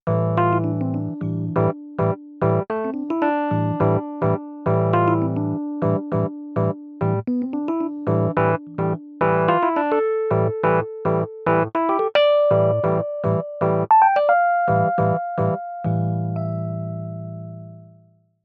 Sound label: Kurzweil PC4 Rhodes